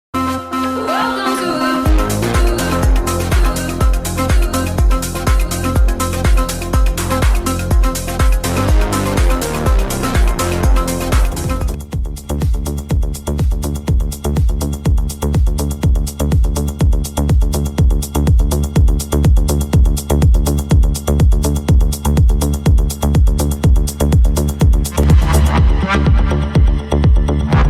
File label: Techno Beats Podcast; Techno Beats 236